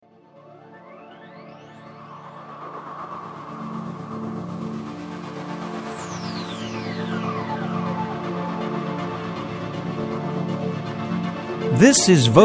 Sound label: Vocal Attack